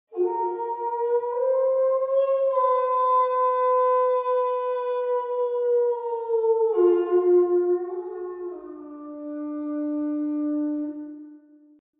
howling; dfcaverns; ambience

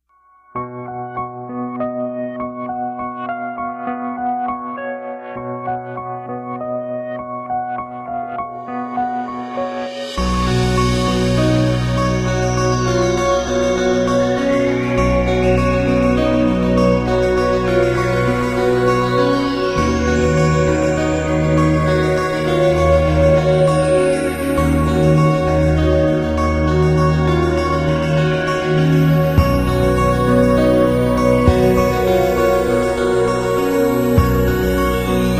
Ethereal Short; Ambience

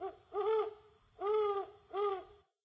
horned owl; server-nalc; ambience modpack

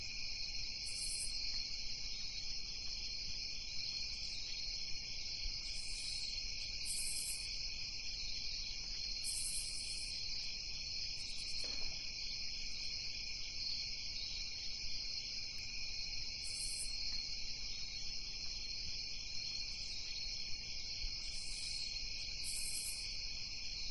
Crickets At Night Combo; ambience

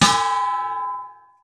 noteblock gong; mesecons

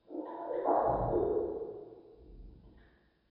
bird budgie song; dfcaverns; df ambience

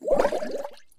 bubbles, bubble sponge; dfcaverns

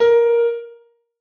noteblock a sharp 2; mesecons; nalc server

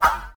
teleporter; hell

